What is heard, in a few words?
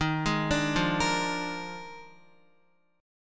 Eb Major 7th Suspended 2nd Suspended 4th Eb Guitar 4E 4A 5D 5G 5C 6F; arpeggiated; x,6,6,7,5,5; EbM7sus2sus4